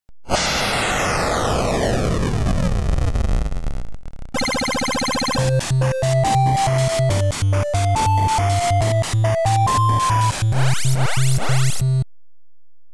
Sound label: fanfare; homestuck